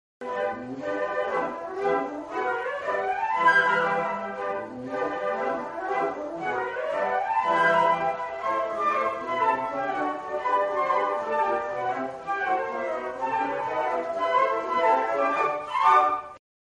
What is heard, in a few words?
concert, flute